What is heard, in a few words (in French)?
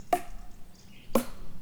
Baton Secs Tic et Toc; Percu Bois